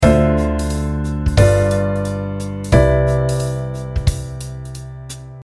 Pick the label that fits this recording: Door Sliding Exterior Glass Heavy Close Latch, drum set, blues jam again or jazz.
jazz